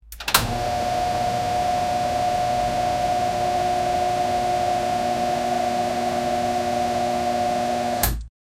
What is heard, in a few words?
air dryer quickly startin